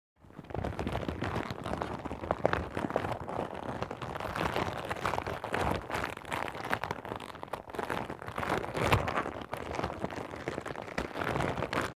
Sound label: Rubber mat handling and stretching